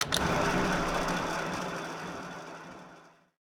spin; Book Of Pharao

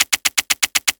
gambler risk rollcard long loop; Fruit Fiesta; Wazdan

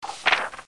UI INFO MORE; games; Amazing Fruits

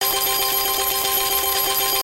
collect; Hot Fruits 20

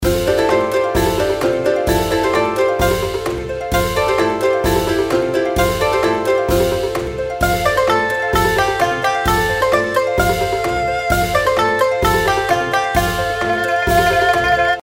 outro bgm; Yun Cai Tong Zi

